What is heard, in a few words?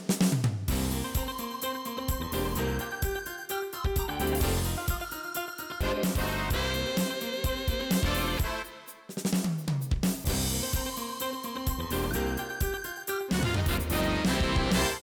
Gospel, Music; Allstate Gospel Superfest